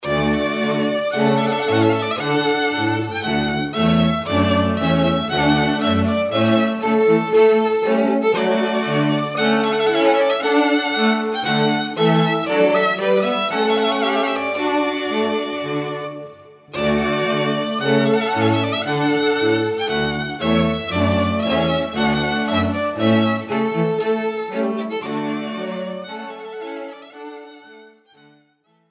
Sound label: trumpet quartet